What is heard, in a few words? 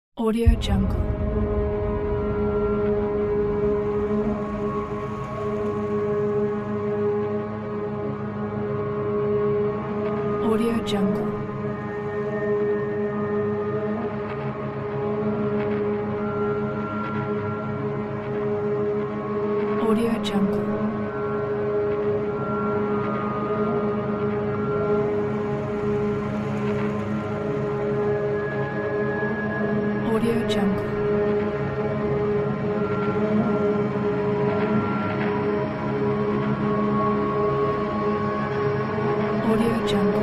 drones atmosphere ambience pack